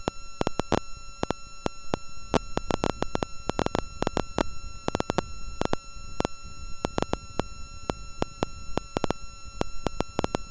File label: americium243, geiger